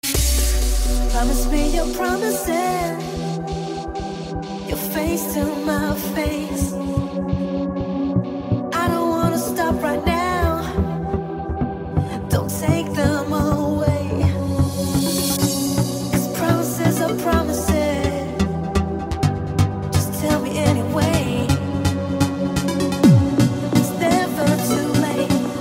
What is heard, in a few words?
soul vibes electro